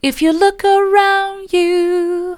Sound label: mid, vocal